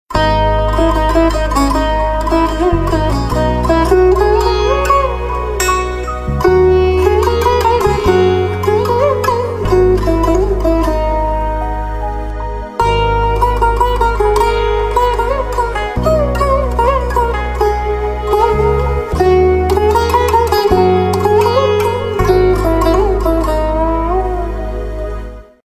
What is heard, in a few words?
Theme Sitar Instrumental Ringtone; sitar and veena